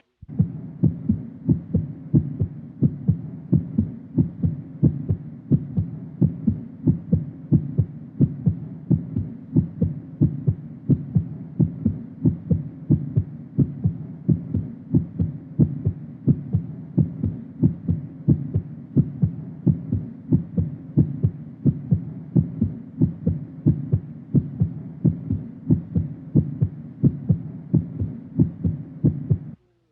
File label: Human Heartbeat